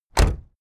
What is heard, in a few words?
car camaro close door